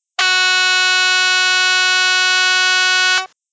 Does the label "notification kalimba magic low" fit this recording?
No